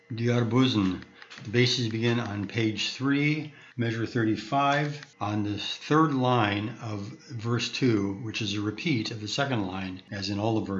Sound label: bass; Di Arbuzn; Yiddish Community Chorus of the Boston; A Besere Velt